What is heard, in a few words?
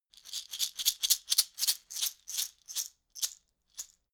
shaker wood rattle dynamic movement